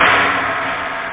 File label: hihat